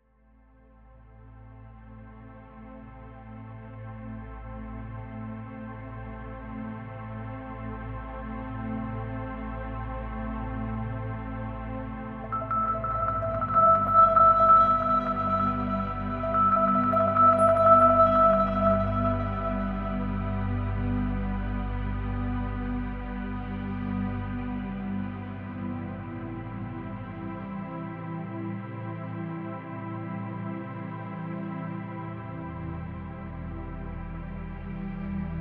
Inner Peace Meditation

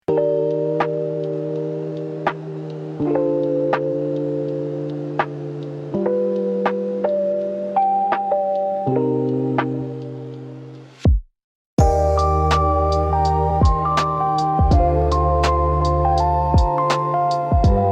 chill lofi study music

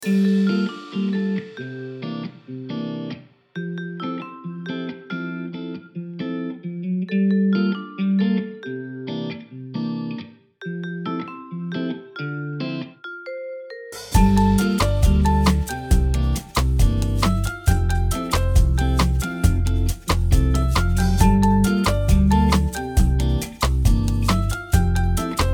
rumba, jazz

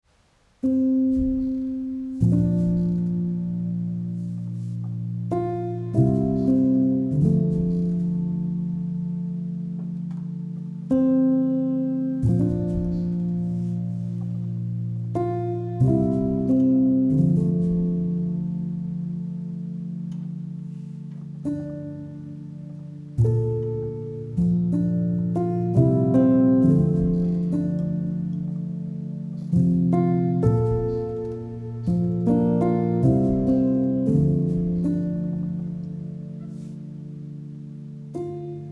peaceful piano

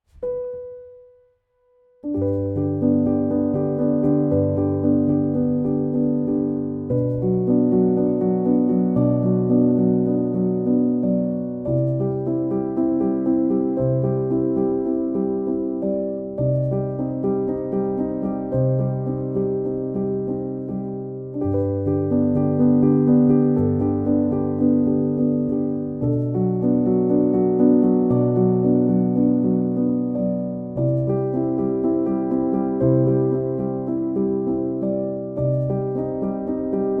whisper calm piano

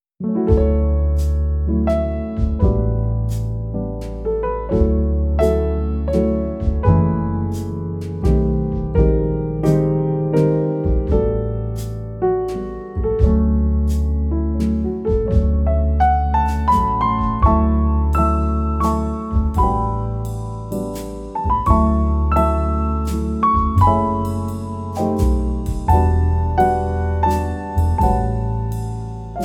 cozy relax jazz podcast coffee ambiance

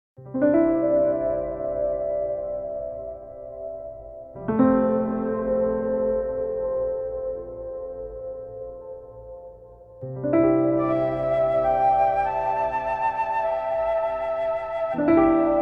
relaxing music

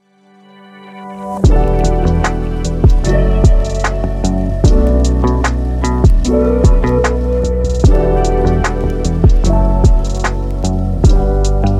midnight thoughts lo fi hip hop

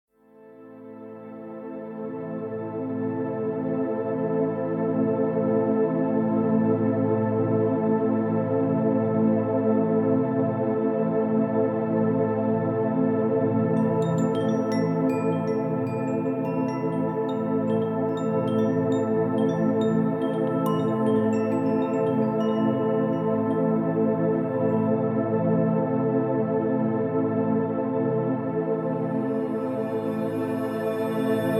Meditative Ambient Music

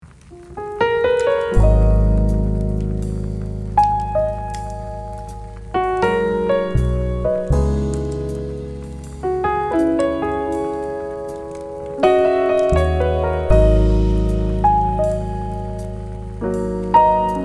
emotions, jazz soundscapes

